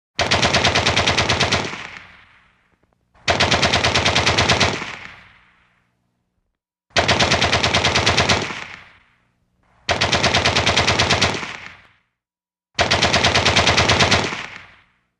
Mach Gun Fire Bursts, WEAPONS - MACHINE GUNS HEAVY OLD: EXT: